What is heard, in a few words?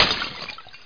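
high glass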